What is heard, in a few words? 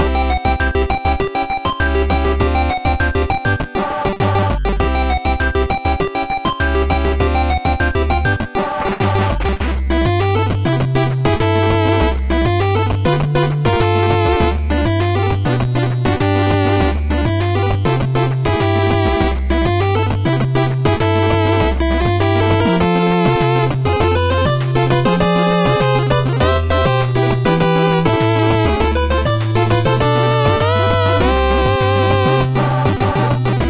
8Bit loop; NSMB